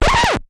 Record Scratch

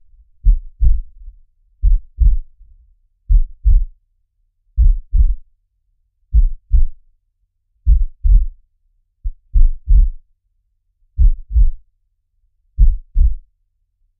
Heartbeat Medium Slow Looping

